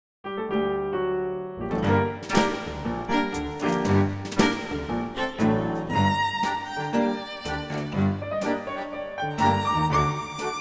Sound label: Deadly Tango